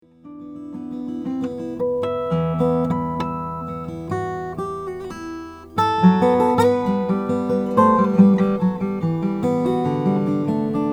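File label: maingard 000 12c brazilian rosewood, guitars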